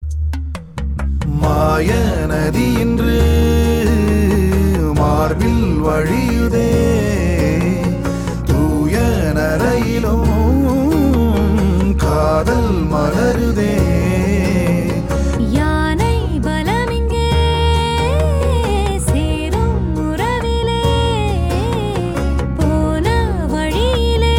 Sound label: Male Female